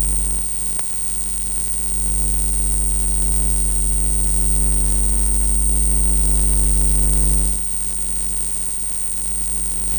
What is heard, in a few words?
faint digital glitching; electrical